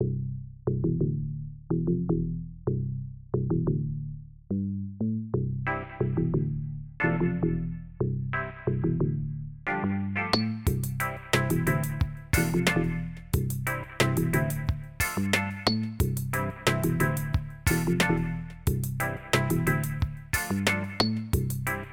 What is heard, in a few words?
summer reggae dub 90bpm